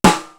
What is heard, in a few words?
SNAP SNARE; East West; Global Sounds